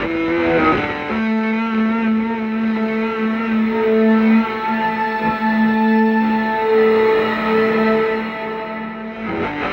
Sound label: Bigburn, Electric Guitar; Sonic Foundry (Sony Creative Software); Friendly Fire; Wrath